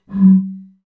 PRC BOTTLE, Bottle Blws

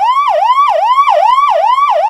alarm; Ueberschall; Techno Trance Essentials

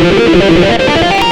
xtra lead

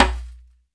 DJMB CSL, DJAMBE, Africa

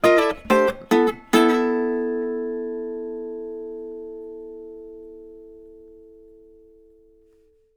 Blues Guitar Samples, licks; ILIO; Fretworks

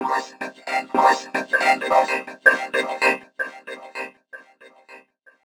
VOICES; E-MU Producer Series Vol. 8 – Platinum Phatt

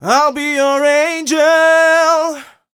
m 127 mel a, Vocals

